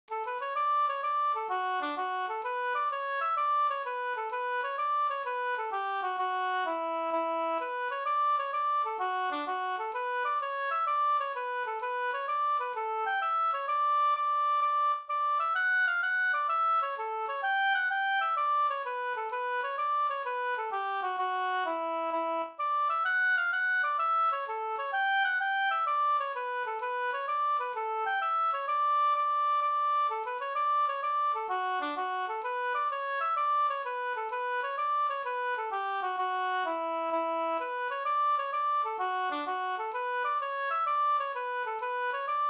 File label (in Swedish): folkdans, Tretur no Ddur; Strandvik